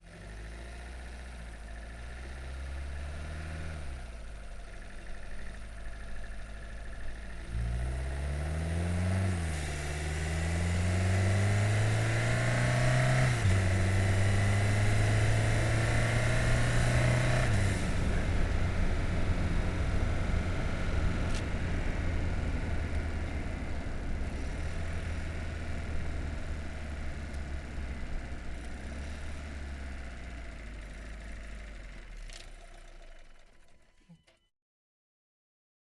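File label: VW Golf onboard fast drive various engine center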